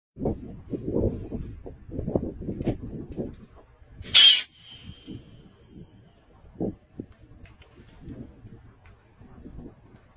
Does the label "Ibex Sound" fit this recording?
Yes